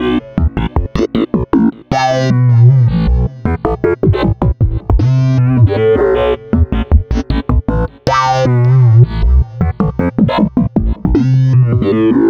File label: Synth Bass